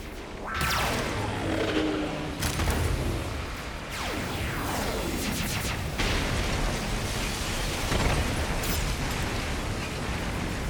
ambience; sf2e guilt of the grave world

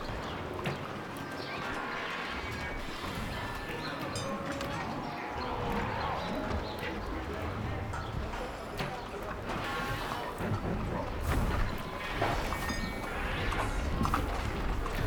courtyard, ambience; grand lodge

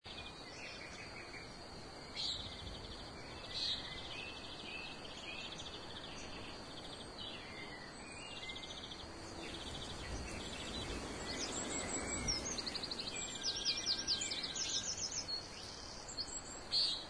Forest Outskirts; fa battlemaps

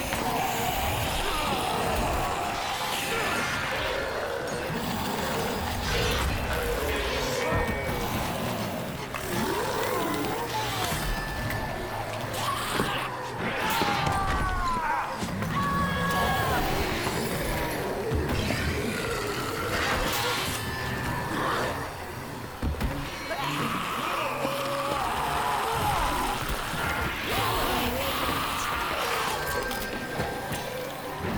skirmish, ambience

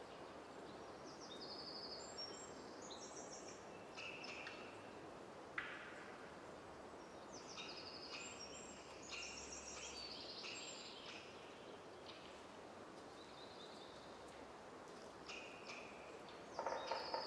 woods, ambience

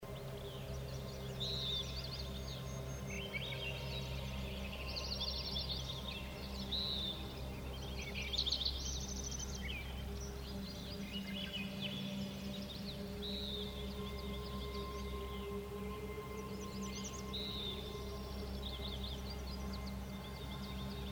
Forest Clearing (Forest); pf2e; the seventh arch

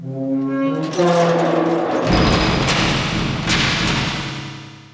Safe Door Close; fa battlemaps

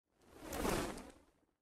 flame blowout; fa battlemaps

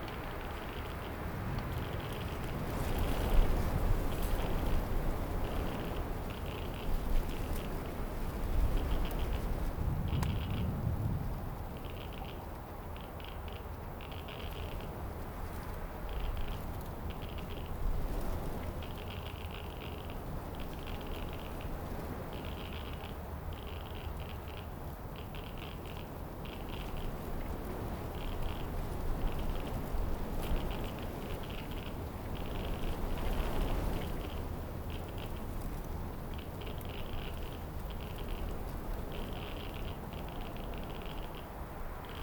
ambience; sf2e guilt of the grave world

